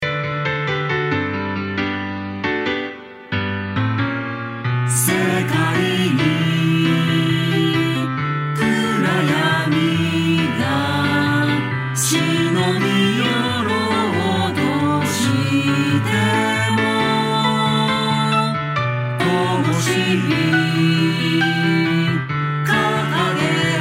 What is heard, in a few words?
piano short singing